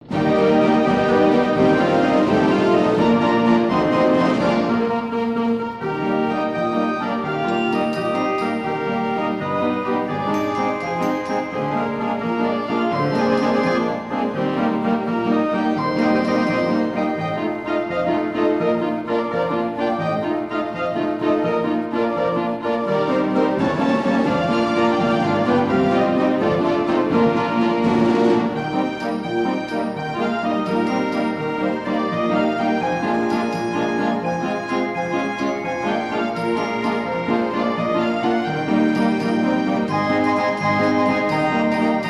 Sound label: hupfeld, band organ; Hupfeld Helios II/25 Orchestrion